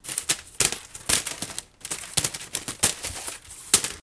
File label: Dirt Slide Lp